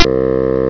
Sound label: BASSOON